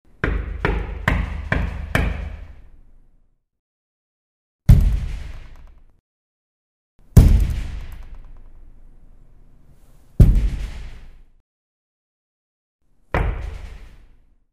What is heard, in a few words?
door knock